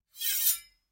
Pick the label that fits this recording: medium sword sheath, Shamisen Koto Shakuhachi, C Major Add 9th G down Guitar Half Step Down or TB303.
medium sword sheath